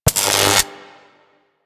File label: Ocean Electric Surge Small; Ambience; Warframe; Grineer Ocean